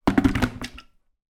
household kettle cordless put down on base plastic